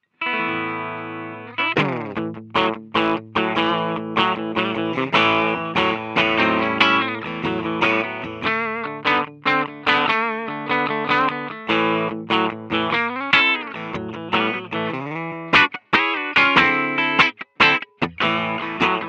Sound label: clean; Jason Becker PBH